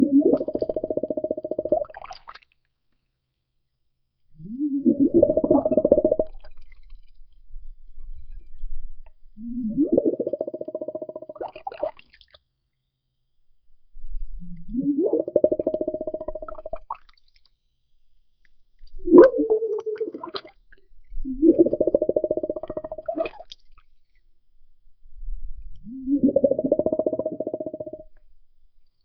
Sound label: sounds of underwater kits